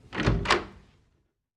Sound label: Door Open Wood; The 39 Steps